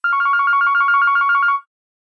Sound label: Digital Ringtone; Huawei Mate XT Ultimate; ringtones